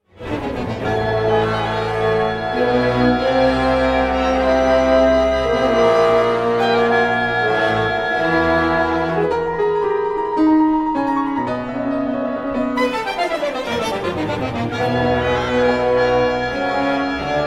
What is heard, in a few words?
for piano and 12 instruments; Paul Hindemith